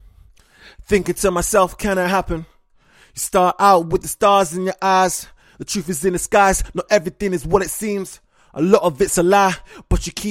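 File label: hip hop 120 F sharp minor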